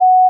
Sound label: G flat 4, pure tones